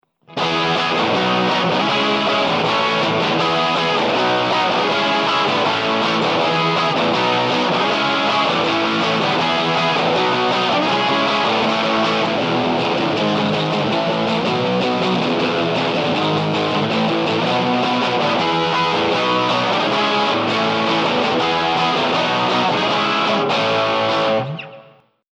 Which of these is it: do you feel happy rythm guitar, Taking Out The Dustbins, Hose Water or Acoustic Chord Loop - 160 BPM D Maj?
do you feel happy rythm guitar